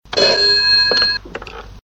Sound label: old ringing phone sound with echo